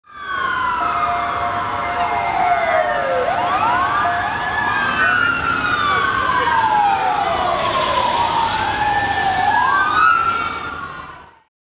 siren

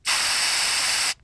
spraying

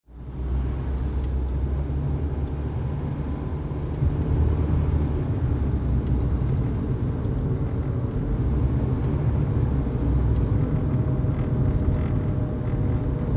car interior, ambience

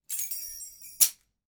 keys catch

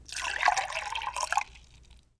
pouring liquid

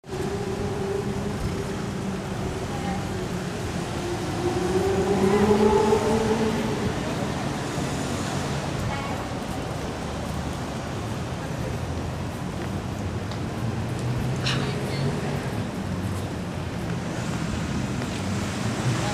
downtown, ambience sounds

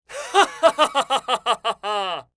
laugh

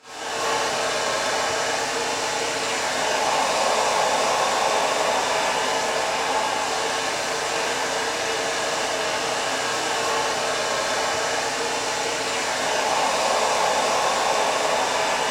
hair dryer

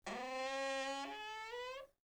door squeak

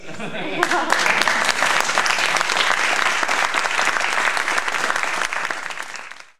applause

